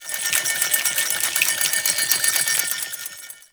Cannister Projectile By; Enemies; Abilities; Warframe; Tau; Dax Archer

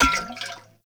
Oil Grenade Bounce; Vehicles; Abilities; Warframe; Nechro Mech

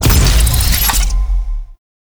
Timer Complete; Gameplay; Kahl Brother; Warframe; Veilbreaker; Devourer Kahl

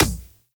Beach Ball Kick; Warframe; Water Fight; Beach Ball Floof

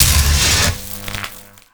Alt Orb Zap; Warframe; Tenno; Grimoire

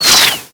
Ink Rifle Reverse Start; Warframe; Tenno

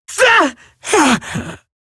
death, female; Warframe; Eximus Grineer Female; Battle Barks

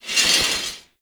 Spear Gun Whizzby; Hoplite

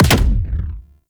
Exalted Sniper Alt Fire Punch; Frumentarius

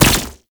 Concrete Archgun Projectile Explode

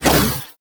Ice Weapon Beam Retract; Warframe; Corpus; Corpus Railjack; Spec Ops Ice Moa

